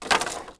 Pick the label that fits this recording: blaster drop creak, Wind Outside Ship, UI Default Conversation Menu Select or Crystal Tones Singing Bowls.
blaster drop creak